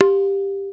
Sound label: TABLA OPEN; Spectrasonics; Heart of Asia; INDIAN SET